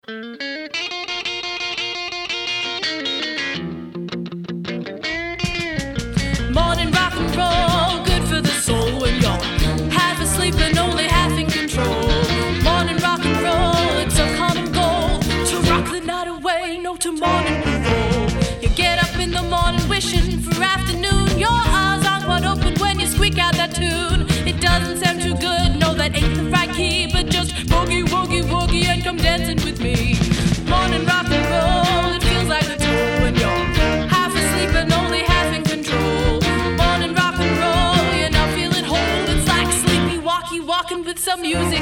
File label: Morning Rock and Roll